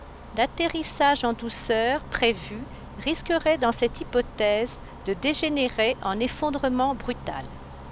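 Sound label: car, car noise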